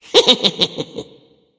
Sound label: laugh; smite; kukulkan